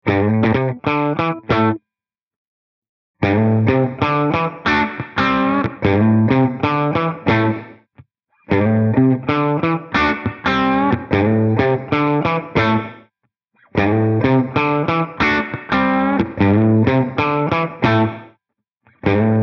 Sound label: Gated Reverb P Funk